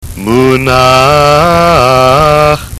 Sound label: munach, trope torah; judaica